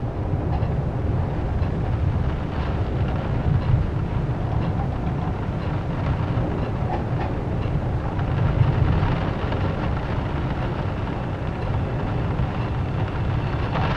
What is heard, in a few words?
wind